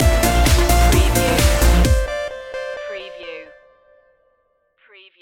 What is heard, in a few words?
electric anthem stinger